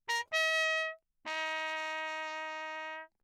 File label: TRP MUTE Bach signal; Perinétventiltrompete